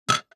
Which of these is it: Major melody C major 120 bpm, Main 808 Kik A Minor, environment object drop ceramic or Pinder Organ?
environment object drop ceramic